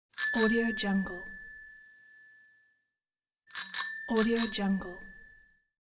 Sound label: bike bell; tr-sound